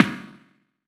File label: hardbassdrumz, breakcore; breaks; Bazzdrumz